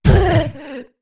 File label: Big Fall; Zora Link landing from a big fall